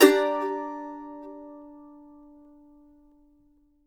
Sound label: mando min; Sampleheads; Whole Lotta Country 1 & 2